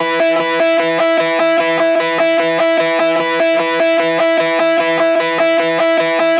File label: 150BPM Guitar Heavy B E; Antidote Advent